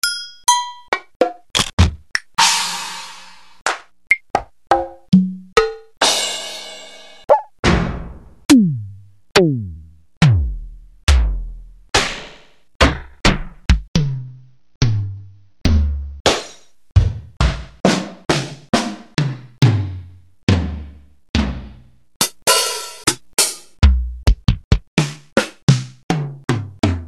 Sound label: Yamaha RX-7